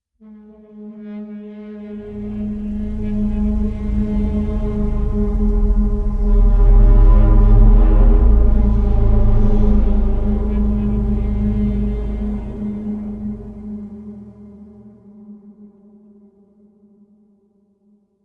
cinematic braaam eerie metallic horns tonal swells distant screeching; call of the dark; sonniss gdc